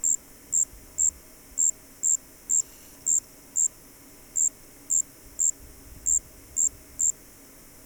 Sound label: striped ground cricket; Insects